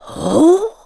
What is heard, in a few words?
Hmm; Impa: "Hmm...?"